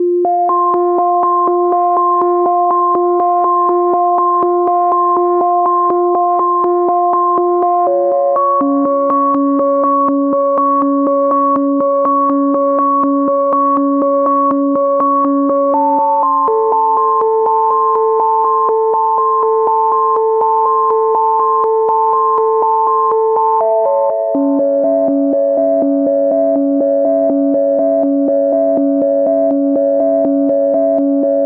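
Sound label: 122 BPM Melodic Arps B major G minor Tonic B; MMB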